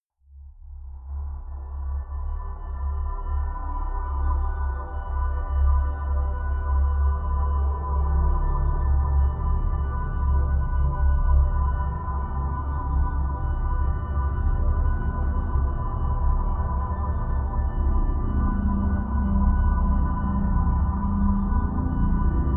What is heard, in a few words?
drone underworld